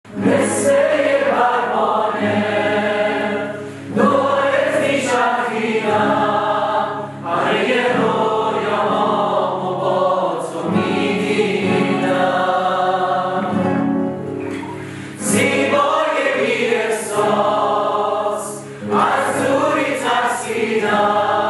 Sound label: Guitar